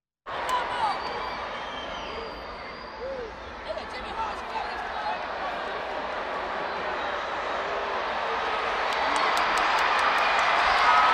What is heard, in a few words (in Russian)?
reakcija tolpy s rebenkom